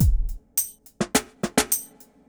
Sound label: east coast shuffle 105